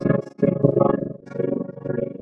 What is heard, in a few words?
overlap test